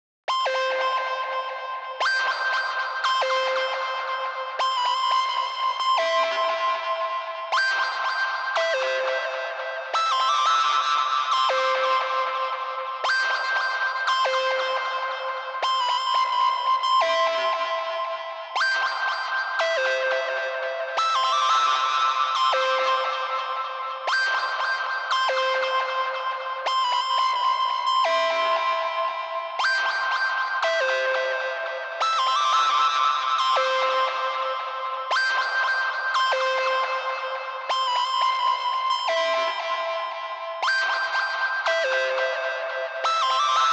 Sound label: 174 BPM A Min Lead, DnB; Melodies; Loop Stems; Axis